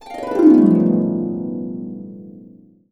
B, Harps

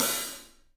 PAISTE HAT, Drums; 3D Sound

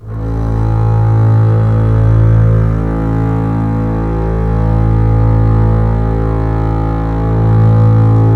LEG MF R, The Ultimate String Collection; East West

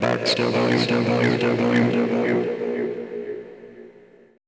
FX VOCAL

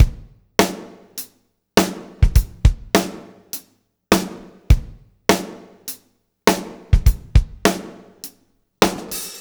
BACKBONE, Backbeat

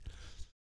BASS BOMB; ILIO; Vocal Planet; Blues & Soul

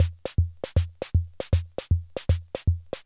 Roland Rhythm 77 Loop; Roland Rhythm 77 Sample Pack

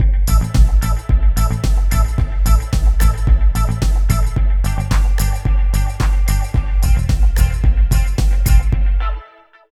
LOOP; East West - Phat & Phunky CD2; 90's Sample CDs

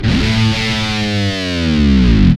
GTR GUIT DI, E.Guitar FX

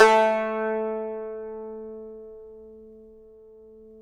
tar; Roland - LA Composer vol. 3

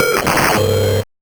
Noise, 8-bit, Bitty Noises

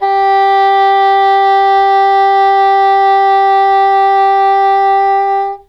EH G4, ENGLISH HORN; Q Up Arts; Denny Jaeger Private Collection

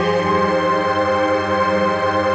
PAD, Dance Mega Synth

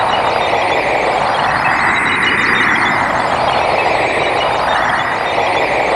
NOISE BELLS; Zero-G Ambient 1; WNOISE ATMOS

